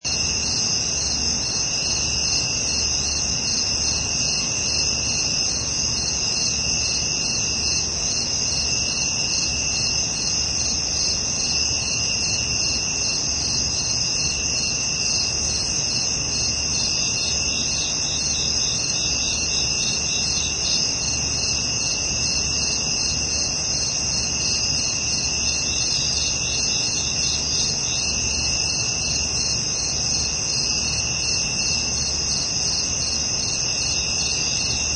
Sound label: Night Insects; Insect sounds; Animals